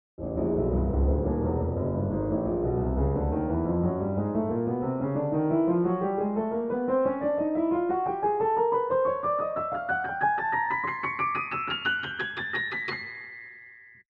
chromatic scale after silicone lube applied to keyfront locators